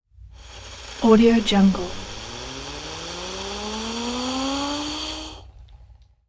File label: ufo zip whistle